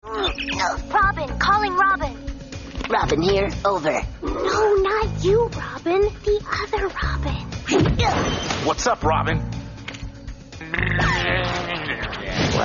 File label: disturbing